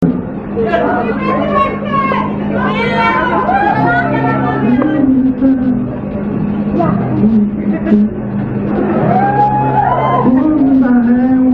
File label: Resurrection Acoustic